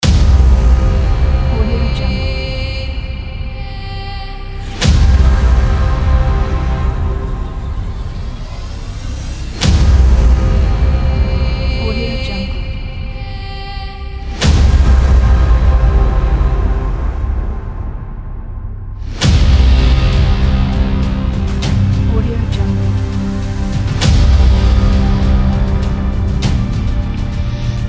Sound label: epic intense teaser opener